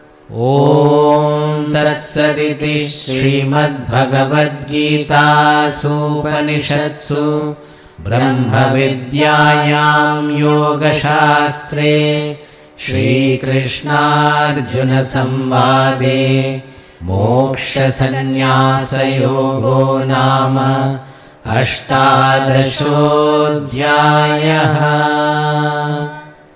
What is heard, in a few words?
Ending Chanting; chapter 18 moksha sannyasa yoga; bhagavad gita; conclusion